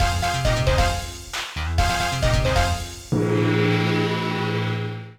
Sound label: Level Start; Dragon Ball Origins 2; DS